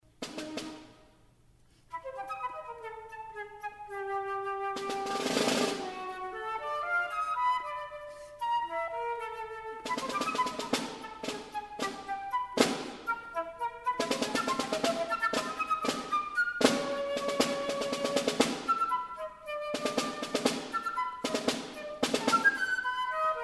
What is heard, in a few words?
Perc